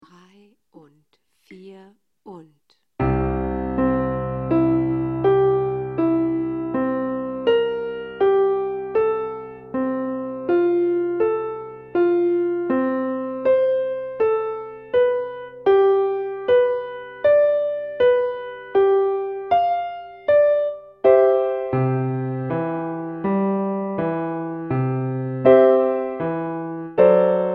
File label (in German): Praeludium in C-Dur, Piano; Play Piano Klassik